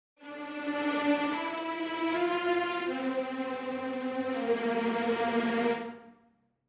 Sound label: Strings 16 Vns Tremolo